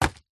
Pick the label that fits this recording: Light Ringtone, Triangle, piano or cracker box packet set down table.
cracker box packet set down table